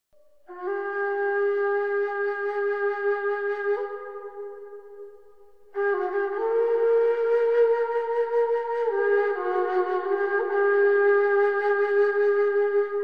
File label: Solo Nay